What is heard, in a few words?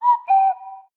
cuckoo ringtone sms